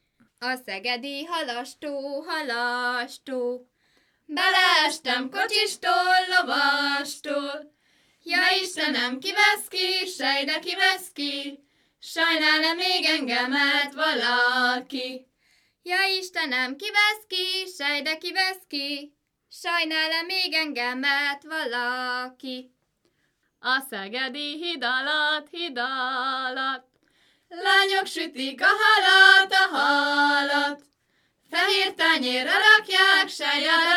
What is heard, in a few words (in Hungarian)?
nepdal; a szegedi halastó